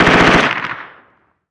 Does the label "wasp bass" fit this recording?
No